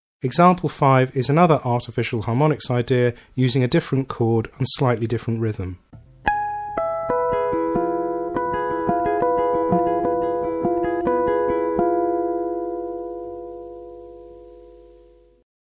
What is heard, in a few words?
Artificial Harmonics Differrent Rhythm And Chord, Guitar